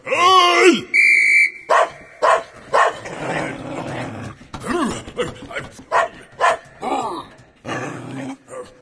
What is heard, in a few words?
guard proximity; subway surfers